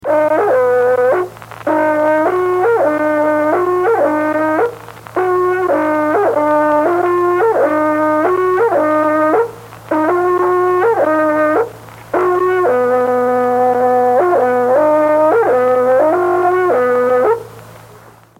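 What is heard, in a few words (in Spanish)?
Toque de trutruka, Mapuche; Música Mapuche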